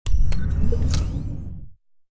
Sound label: Object Bridge Elevator On